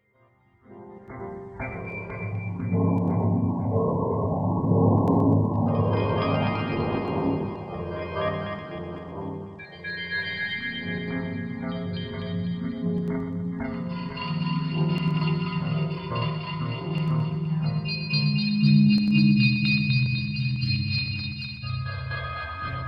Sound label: Db Harmonic Minor 60, Deconstruction